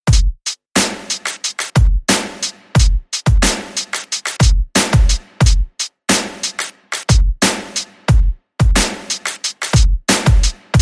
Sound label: Tomorrow Beat